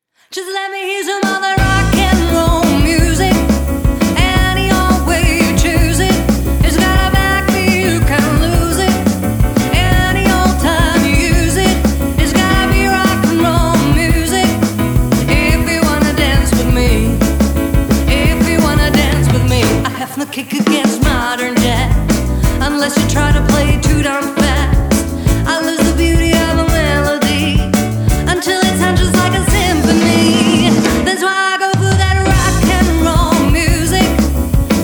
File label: Rock n Roll Music